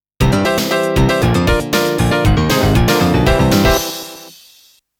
DRAW GAME; SNK Arcade Sound Digital Collection Vol. 23

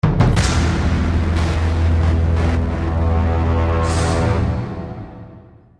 STINGER Negative; Gun Bros 2

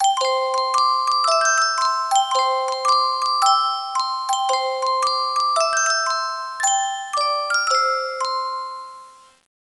pop; petz 5

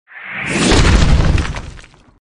Punch; Sfx Baphomet Punch

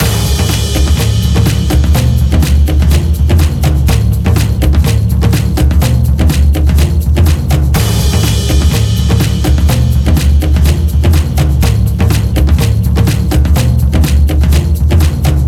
TENSION LOOPABLE

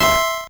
core squish C4 E3

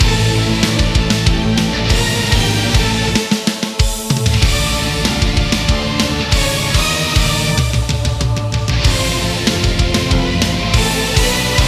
Combat Theme LOOP

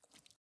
water jump

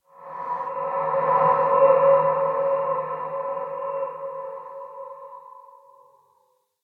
Combat Horror

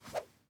throw; teardown; ps5 windows xbox series xs

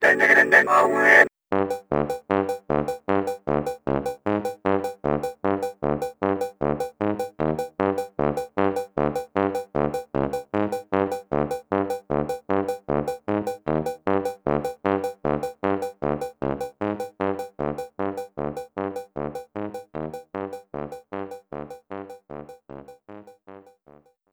Telephone Theme

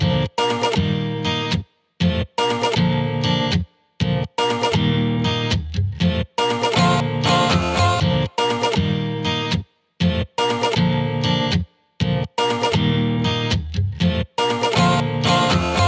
Grass Inst

